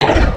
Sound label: hit, creatures organic